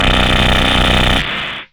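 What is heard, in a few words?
RUMBLE right, Hard & Loud Techno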